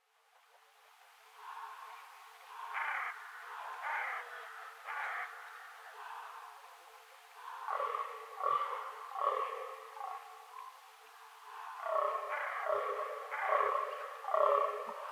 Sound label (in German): Kolkraben und Damhirsch Schorfheide